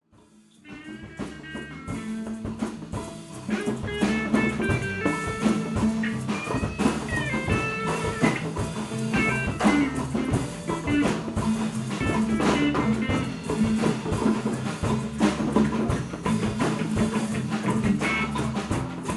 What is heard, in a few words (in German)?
Perkussion